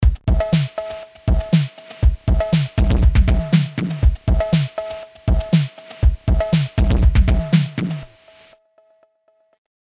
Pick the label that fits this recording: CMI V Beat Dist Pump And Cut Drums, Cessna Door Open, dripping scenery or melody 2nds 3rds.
CMI V Beat Dist Pump And Cut Drums